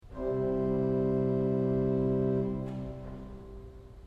I gamba 8 C

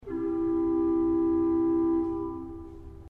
II copel 8 C; srednja vas

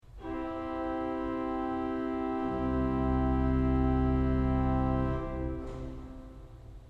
I zbirnik piano

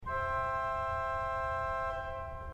II flaute minor 4 C; srednja vas

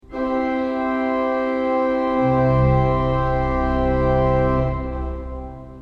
I zbirnik forte

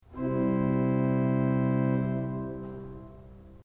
II floten principal 8 C

